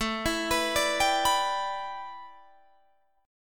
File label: A 9th Suspended 4th A, Guitar DADGAD; 19,19,21,19,22,21